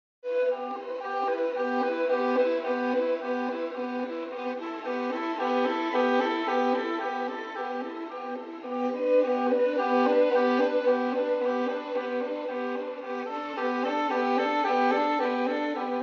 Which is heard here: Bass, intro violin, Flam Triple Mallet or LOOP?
intro violin